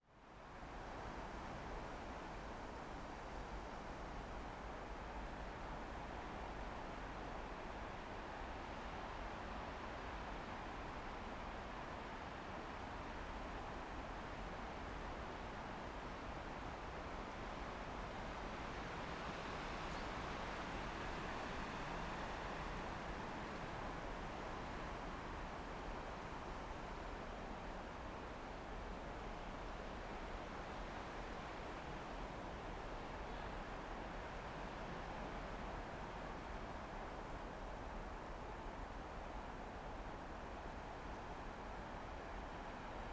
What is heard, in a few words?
Soft Wind Noise In Pine Trees